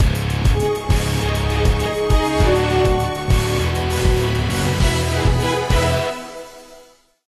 Bumper String; Iron Man 3 2D Version

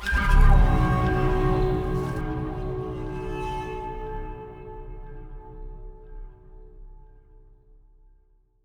Stinger Spawn; Eden Rising

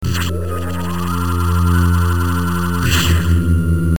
plasma beam; Metroid Fusion game rip

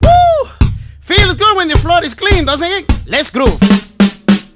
Exiting Cool Mode

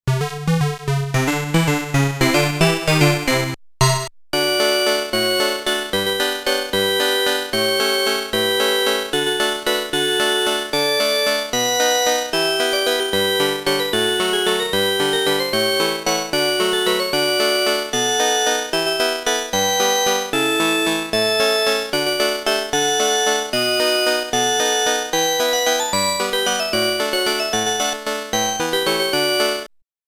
Round Start Bonus Round Music

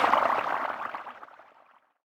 Zombie Torpedo Stop; Lagoon

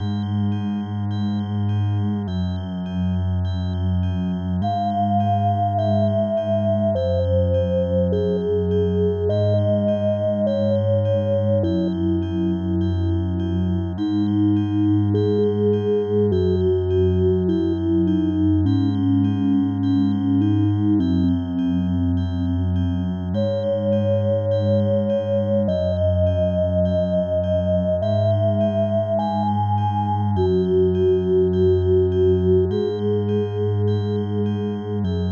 Dungeon; Cyber Knight; SNES

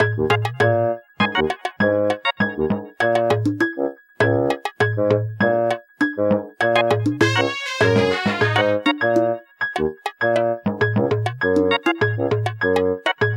Got Key; Pikmin 2; Working

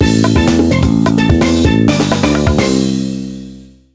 Jingle Hawai

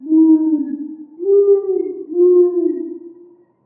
Owl Hoots

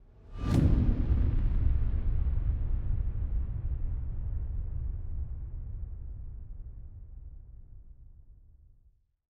Shock Subtle; Pineview Drive

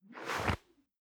puzzle in

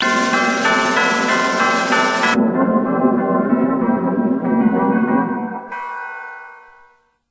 Bell Roll'd; Disney's Magical Mirror Starring Mickey Mouse; gc

